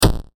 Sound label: damaged; attack